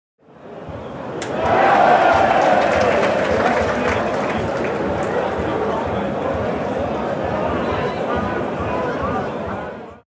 Stadion Fans und Gesaenge

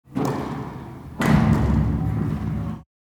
Ball schlagen

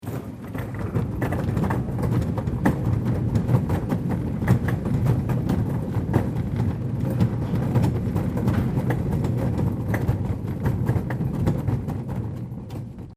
Wagen schieben

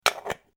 kueche verschluss

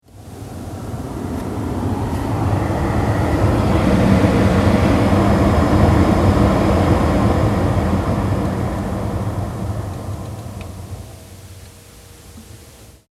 Auto Luefter Frontscheibe

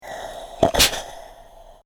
home buegeln dampf vorn

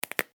Brausetablette durchbrechen

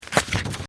office blatt

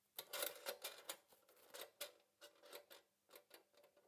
Personenwaage

